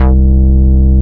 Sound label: HIP, HIPHOP; Rubber Chicken Software Sample Bank